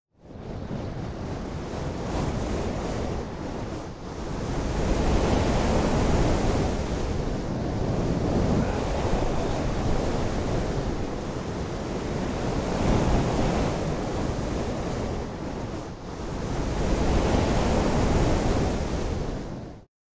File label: Cities cape Wind